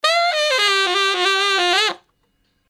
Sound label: brass loop